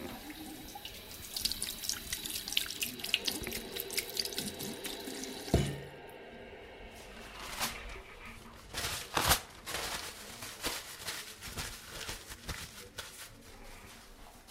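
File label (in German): Hände waschen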